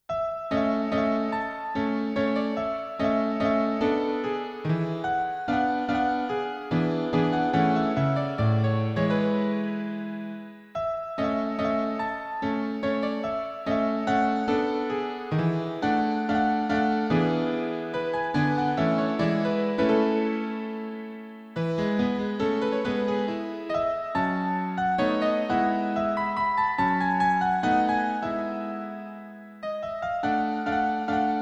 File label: Viennese Classic Landler